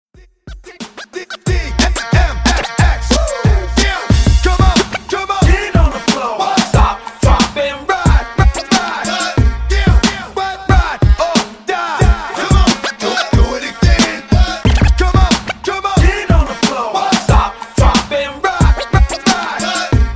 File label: Funk Transition 91 126 Bpm